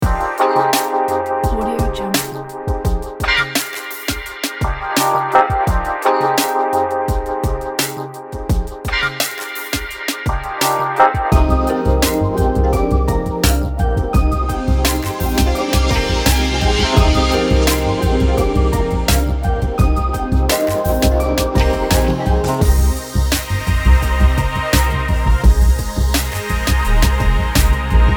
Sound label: summer sexy chill; betsy b